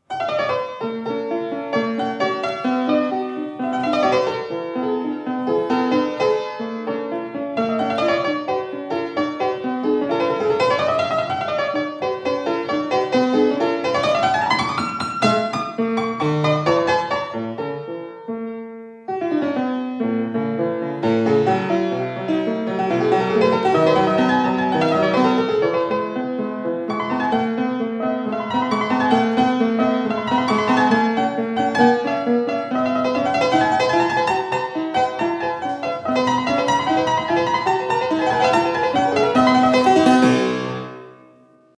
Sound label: fortepiano